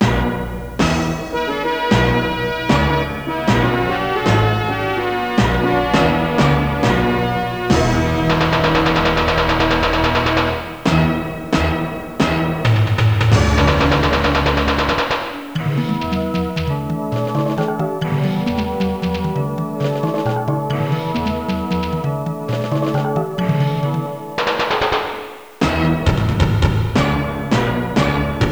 8bit action adventure

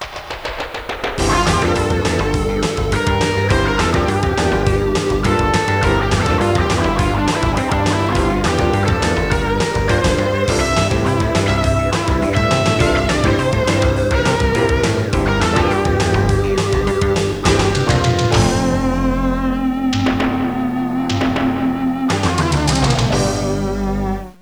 8bit rock

